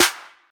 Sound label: clap sound f key, Royality free clap sound clip tuned to the F note. Loudest frequency: 4110Hz; percussion; single hits one shots